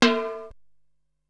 Timbale, Drum Machines Ensoniq SQ-R-Plus; 300 Drum Machines

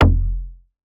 Bass F, Bass Synth

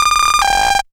Alarm; Jungle Warfare 1&2

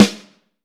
Vintage Snare Drum, 6.5X14; Q Up Arts; Johnny.C's Vintage Snare Drum; 6.5X14 LUD

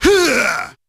F4 Huuaah!, Yamaha PSR-E323 Sound Effect Kit

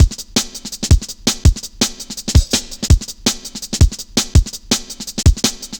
Virtual, Drum Loops, 165.5, Jungle; Zero-G Jungle Warfare 1, 2 & 3

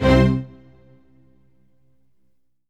ORCH HIT C2; Q Up Arts; Denny Jaeger Private Collection

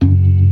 ORGAN, C3 PERC; Keith Emerson Signature Series

